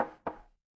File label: impact wood knock